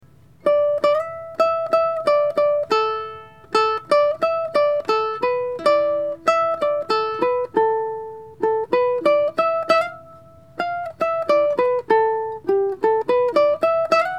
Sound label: Short and Sweet Uke